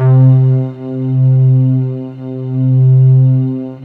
HORNS C3

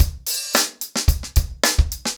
110BPM, acoustic drums; The Roots Drumloops

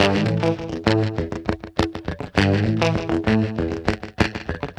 Guitar Loop; Ambient Grooves

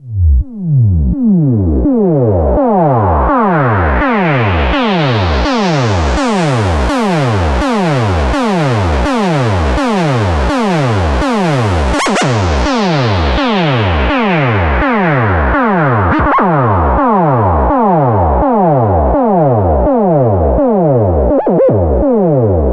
synth arp; Electrocution

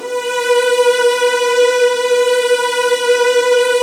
B2, Virtuoso Strings

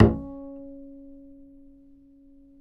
acoustic bass C#4; Spectrasonics; Bass Legends

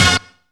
NOW HIT; Zero-G; Funky Elements

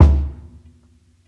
60s KICK SOFT, drums; BASEMENT DRUMS VOL 2

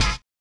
collision; Spectrasonics; Metamorphosis

